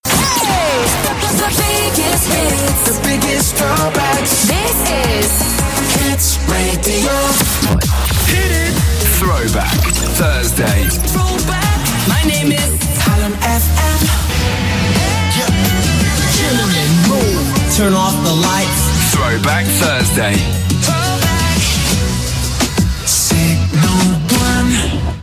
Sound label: Hits Radio network jingles; lokale namen fm stations in engeland en wales omgedoopt in hits radio